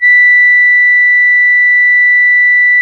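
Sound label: CONCERTINA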